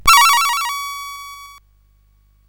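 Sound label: Moon Patrol, MOS 8580 SID chip music, games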